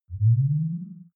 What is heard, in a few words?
game start deep, signale